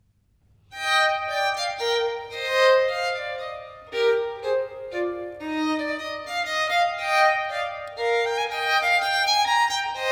Violin Partita No. 3 in E Major, Menuet I, II; Bach; Sonatas and Partitas; BWV 1006

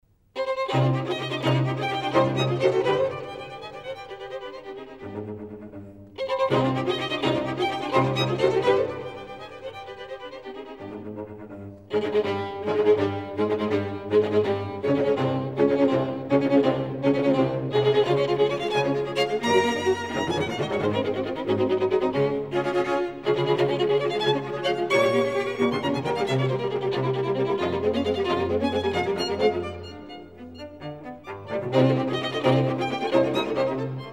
String Quartet in E flat

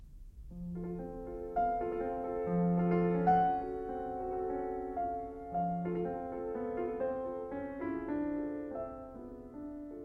Prelude in F major, piano; 24 Preludes

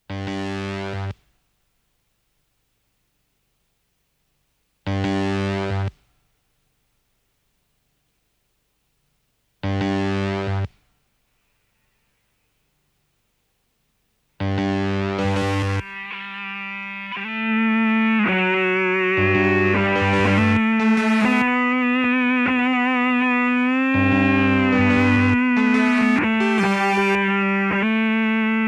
Loop Solo

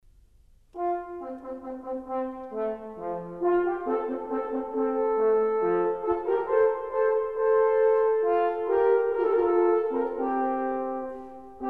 Water Music Suite

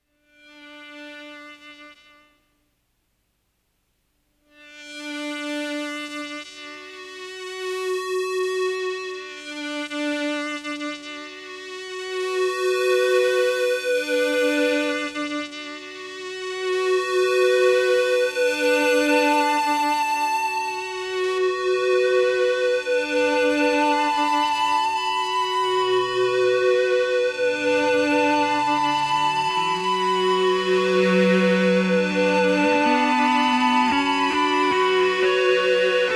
Loop And Solo

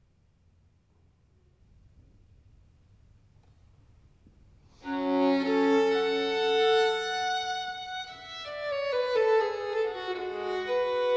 Sonate nr.1 in G minor BWV 1001, Violin Solo; Bach; Sonatas & Partitas for Violin Solo

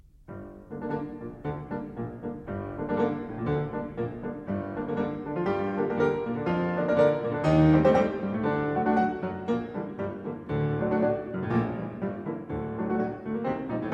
Prelude in G minor, piano; 24 Preludes

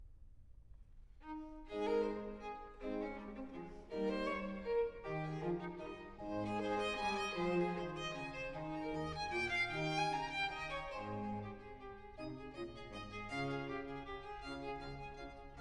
Duet; Bach; J S Bach; Easter Cantatas; Erfreut euch, ihr Herzen Cantata, BWV 66

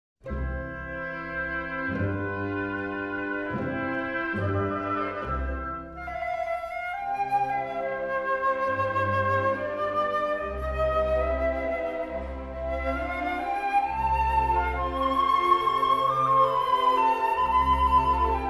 Syrinx, for solo flute

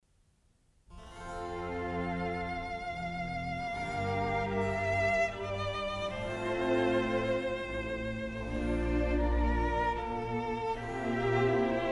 Double Concerto, Violin Concertos; BWV 1043; Johann Sebastian Bach

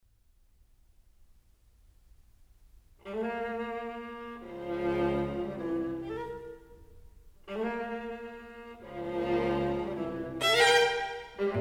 String Quartet in F major

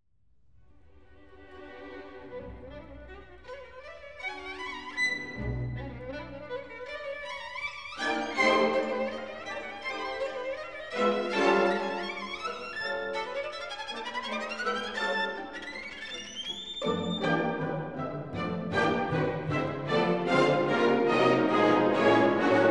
Violin Concerto in B Minor

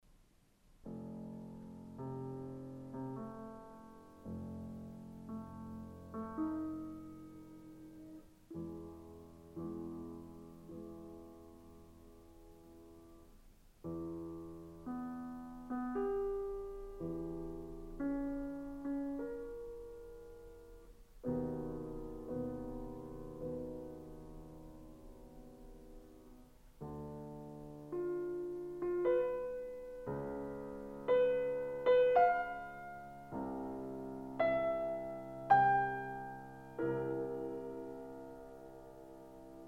Piano Sonata No. 21 in C major; Wilhelm Kempff; Beethoven; Piano Sonatas

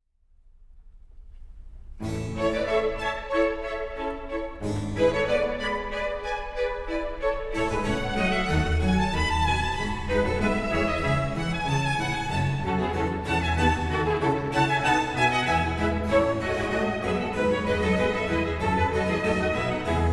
Oboe d'amore Concerto in A Major; Bach; J.S. Bach

